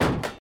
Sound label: robot step